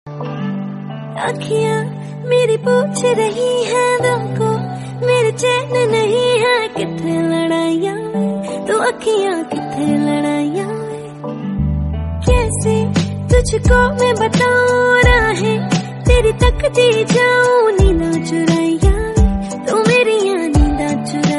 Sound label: Female Version Ringtone